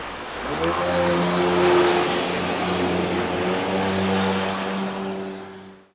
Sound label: BOAT